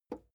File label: plastic button small simple release